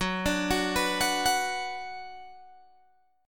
Gb Suspended 4th Sharp 5th Gb, down, Guitar, Open G